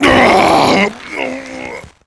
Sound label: death, cyborg